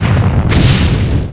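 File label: STONE FALL; CHASM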